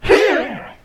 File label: attack; I Am Weapon Revival; Jocker